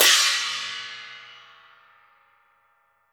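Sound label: LARGE CYMBAL, CHINESE PERC; Spectrasonics - Heart of Asia CD2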